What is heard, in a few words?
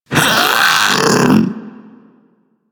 Infested Splitter Top Vocal Alerted; Warframe; Grey Strain